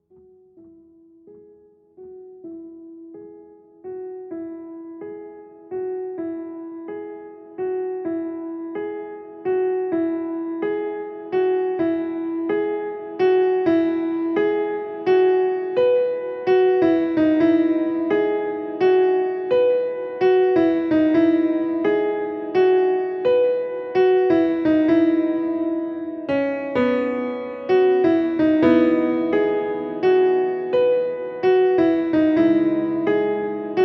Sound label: Piano Version